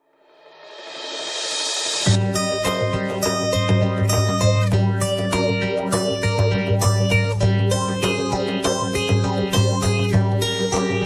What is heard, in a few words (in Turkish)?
Telefon Zil Sesleri, Duygusal Zil Sesi Muzigi